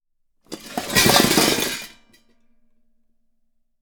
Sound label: Pile of Scrap Metal - Metal Scrap Pile, Rustle, Drop, Long Tail; Junk and Debris; Double Trouble Audio